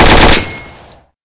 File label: shot burst, weapons; MP5